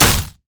grasping roots hold; Zyra; LoL

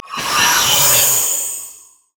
wraith angry; LoL; monsters

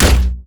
basicattack; LoL; twitch

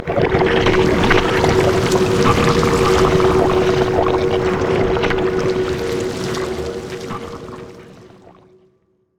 pain buff loop; LoL; trundle

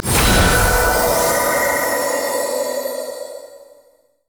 summonerspell base heal; LoL

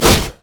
mage chains; LoL; ironforge xerath

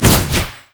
monkeyking decoy swipe; LoL

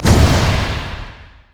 twin bite human; LoL; shyvana

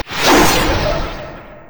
yasuo base crit attack; LoL

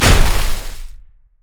ravage strike; nautilus

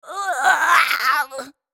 Tristana dying

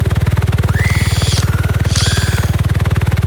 hermit robot idle

